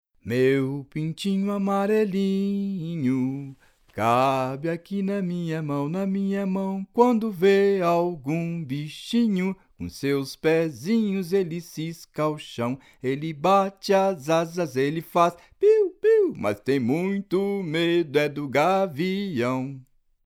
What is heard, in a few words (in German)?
portugisisch Kinderlied; Brasilien